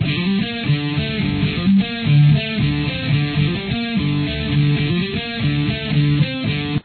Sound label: guitar